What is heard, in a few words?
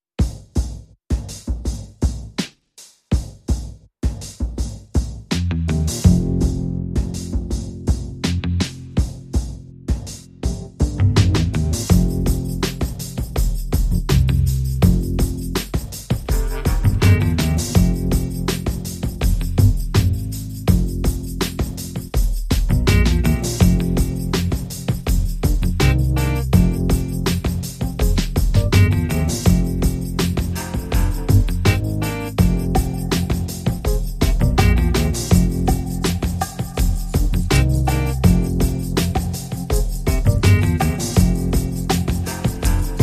No Flute